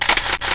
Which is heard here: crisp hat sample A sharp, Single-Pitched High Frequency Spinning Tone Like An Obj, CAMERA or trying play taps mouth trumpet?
CAMERA